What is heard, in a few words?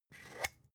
spice jar scratch tonal open hard; industrie wirtschaft; gastronomie